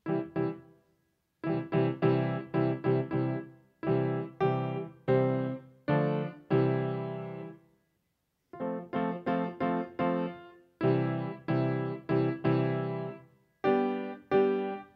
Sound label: Gospel Bells